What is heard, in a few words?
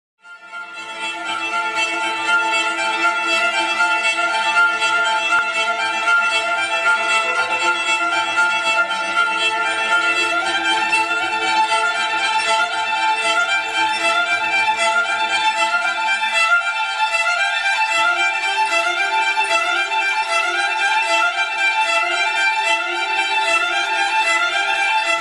fiddle; کمانچه